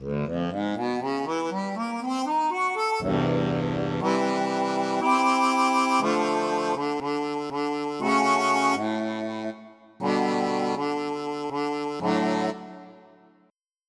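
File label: Vibrato Dry, harmonica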